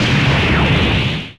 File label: fire fire